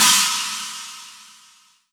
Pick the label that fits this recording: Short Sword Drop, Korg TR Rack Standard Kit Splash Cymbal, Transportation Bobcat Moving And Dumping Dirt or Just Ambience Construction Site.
Korg TR Rack Standard Kit Splash Cymbal